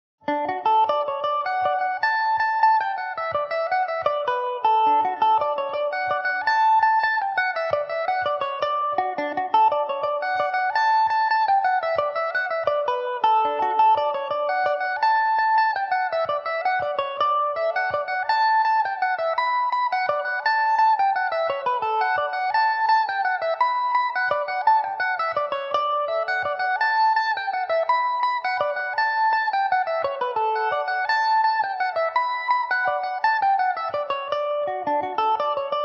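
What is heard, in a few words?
miss bensons fancy D major, irish mandolin; Epiphone Mandobird VIII electric mandolin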